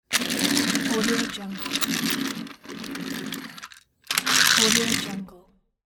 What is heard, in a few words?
rolling plastic toy car